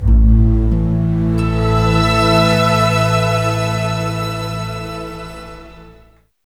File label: CLOCK; East West; Scoring Tools; ORC; Clock Time